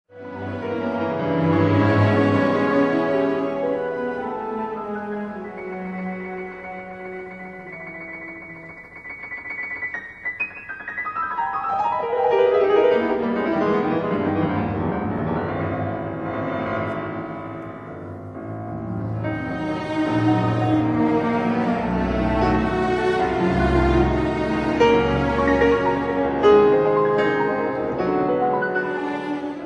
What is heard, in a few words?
Piano Concerto in E flat major